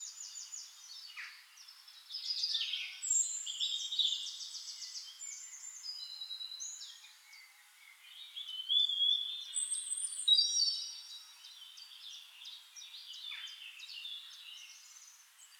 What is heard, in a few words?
Birds In Spring